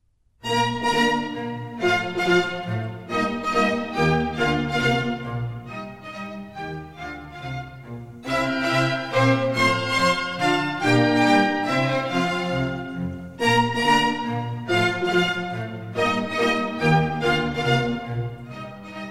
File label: Minuets, Bb Major; Mozart; Dances and Marches